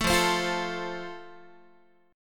F Major, Guitar; strummed

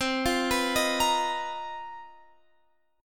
B Major 7th Flat 5th C, chord shape; BM7b5/C; arpeggiated; JGuitar

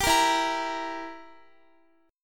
E Diminished down Guitar; strummed

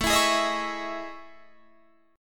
A Major Sharp 11th, Guitar, Standard; AM#11; strummed; JGuitar

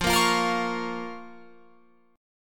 F Suspended 4th Sharp 5th F, Guitar Open F; Fsus4#5; strummed

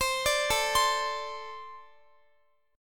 A Minor Double Flat 5th, down, Guitar; Ambb5; arpeggiated

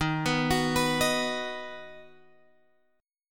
Eb Minor Sharp 5th, down, Guitar, Drop D

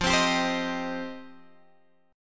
F 7th Suspended 2nd F down Guitar Open G

